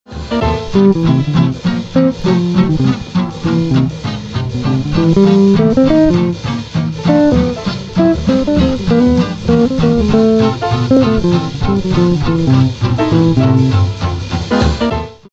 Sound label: blues